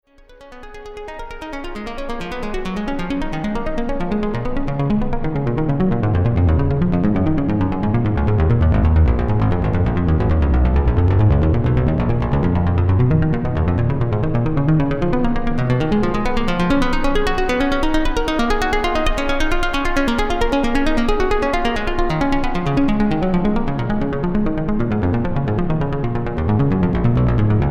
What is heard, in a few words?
karplus strong range with external LFO damping